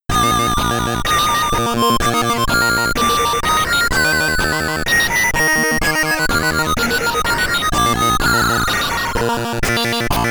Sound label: Ending (SID Stereo); zoids; Commodore 64